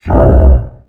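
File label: commando voice hurt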